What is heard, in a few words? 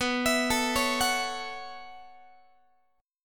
B 9th Suspended 4th, down, Guitar; B9sus4 arpeggiated; 4A,4E,5A,5D,5G#,6C#; chord shape; JGuitar